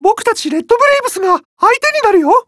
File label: Warcry